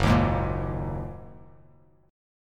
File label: Gb 6th Add 9th Gb down Bass Standard; strummed; Gb6add9; JGuitar